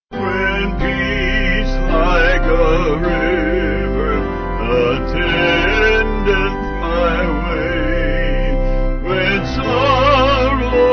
Vocal Piano Organ; When Peace Like A River

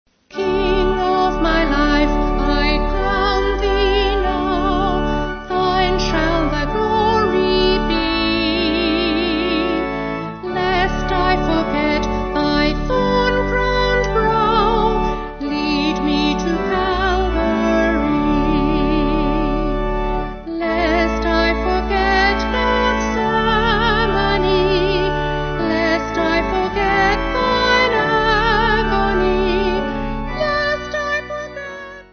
Vocal Organ; King Of My Life; Duncannon

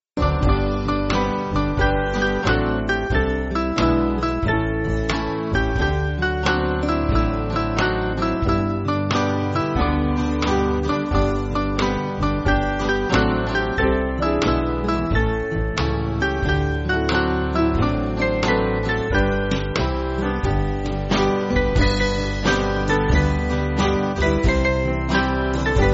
Band, church music

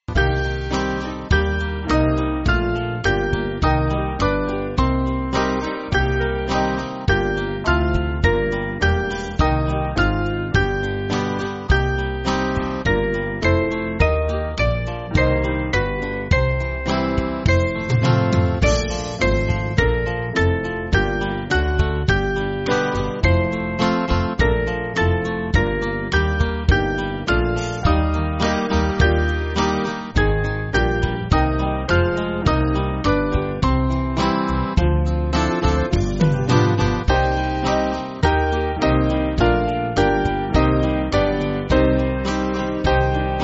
Band, church music; Our Father; Vater Unseer

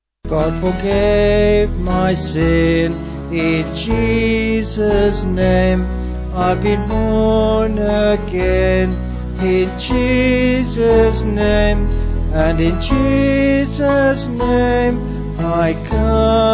Vocals Band

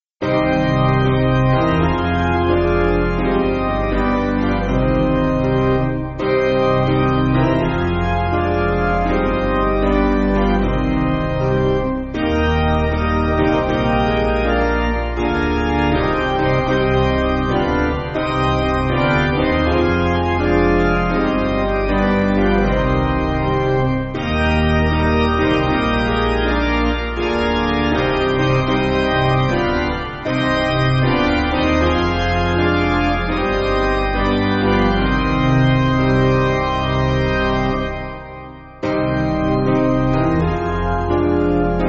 Piano, Music Backing Files for Churches

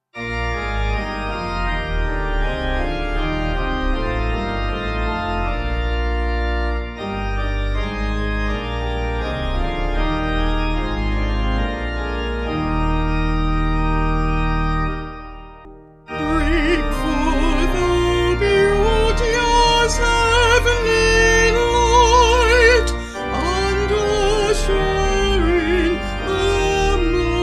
Break Forth Ermuntre Dich Vocal Organ

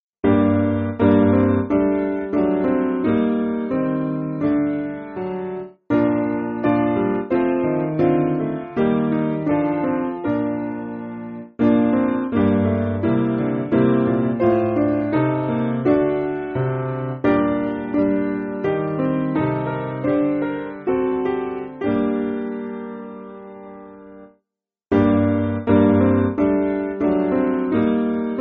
Tunbridge, Piano; Sinful Sighing

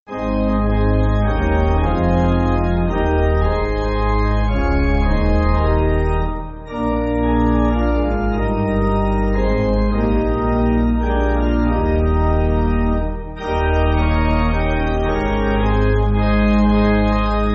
Pipe, church music

